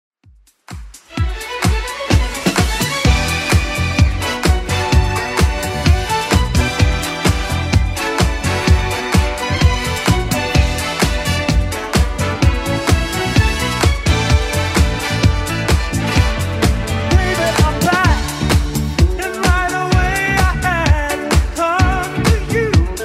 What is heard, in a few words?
80s Club 128 bpm